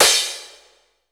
ROOM, ROOM KITS; Crypton - DrumBase5000

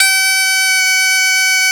BOMB1 G3, BOMBARDE1, Celtic Flavours; USB Soundscan vol.42; AKAI

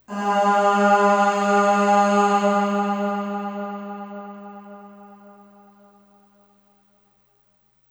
Aah Short G#2 Long Reverb; Harmonic Building Blocks

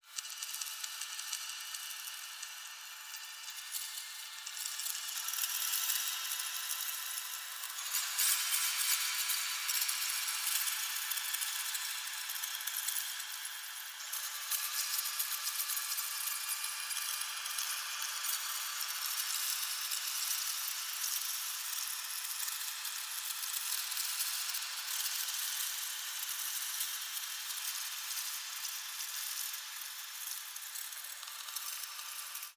atmos; ambient or nature

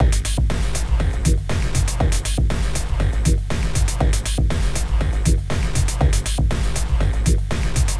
Tectonic Groove; Sonic Foundry; Crimson Blue and Fabulous Horncraft 4 RnB; Loop Samples

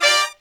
BRAZ LICK, BRASS LICKS

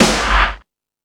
Dance 2000, Drum Machines E-MU Pro-Cussion

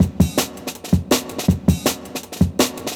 162 Jungle, Jungle Breaks; Original Jungle Breaks

